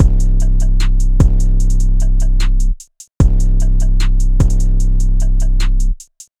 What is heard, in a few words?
weird trap drum part; looperman